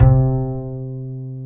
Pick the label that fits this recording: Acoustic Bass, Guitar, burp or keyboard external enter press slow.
Acoustic Bass